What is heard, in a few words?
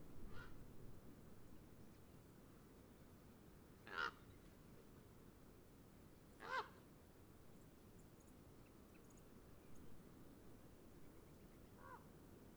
Ravens Raven Close; Medlock 2015